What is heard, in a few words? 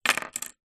household keyring plastic drop wood